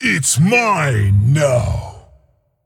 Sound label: Male Demon Attack